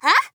Grunt Jump; Fairy; Paladins

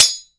creep shared melee impact; Dota 2; weapons

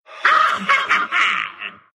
Raz laugh, ru

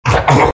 big pain; Undying

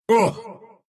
pain ru

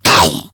pain

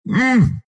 pain, ru; Dota 2

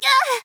Grunt Hit; Fairy

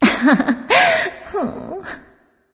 laugh; Izanami; Smite

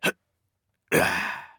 grunt jump; Paladins; Owl

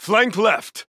Attack; Darklord; Paladins

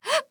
Breath; Paladins; Skye

